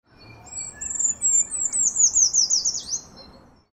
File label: treecreeper